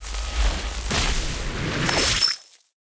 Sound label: chest feature; goldentavern